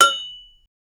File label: a pachinko brass metal pi